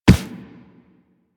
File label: Run Default, Footsteps; Warframe; Operator